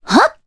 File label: Vox Jump, jp; Cassandra; kingsraid